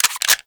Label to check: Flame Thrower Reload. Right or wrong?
Right